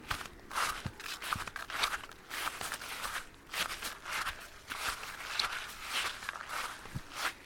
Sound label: Grass Step